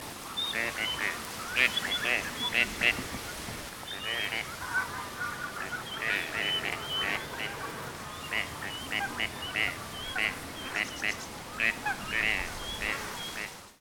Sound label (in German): schnatterente